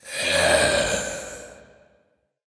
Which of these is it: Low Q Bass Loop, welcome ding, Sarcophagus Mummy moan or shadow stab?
Sarcophagus Mummy moan